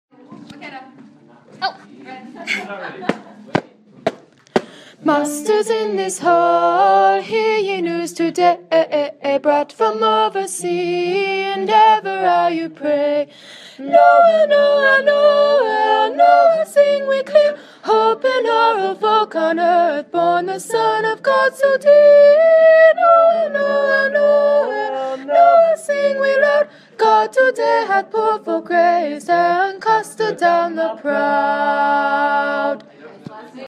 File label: sopranos; masters in this hall